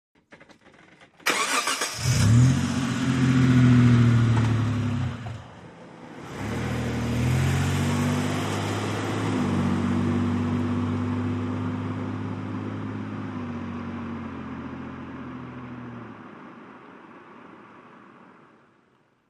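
Montero Start Away Med, 1998 mitsubishi montero ext.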